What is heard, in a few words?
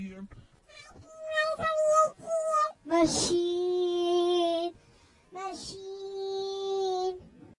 typing letters on the atm machine